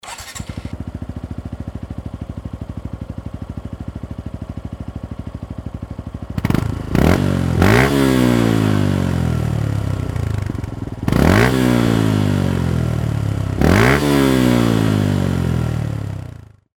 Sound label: gixxer; gixxer bms r 30b